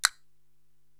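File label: Castanet Double; Korg TR-Rack; Drum Machines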